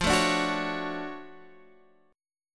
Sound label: D-Major 7th F; DM7/F Chord {X 8 7 7 7 9} strummed